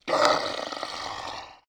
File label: Monster